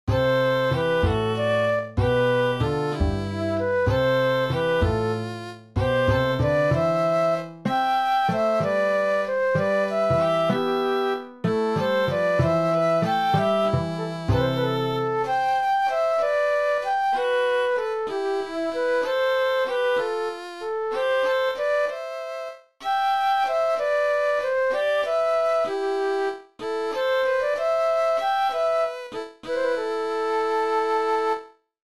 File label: waltz; heather island